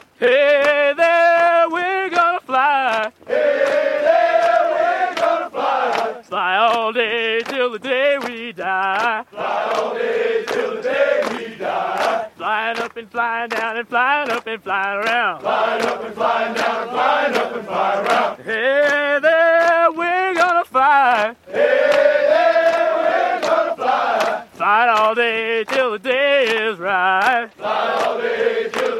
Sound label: US Air Force March